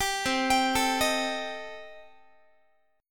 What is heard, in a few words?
C Minor 6th C Guitar; Cm6 arpeggiated; 22,20,22,19,19,x